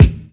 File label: 00s clean kick drum one shot d sharp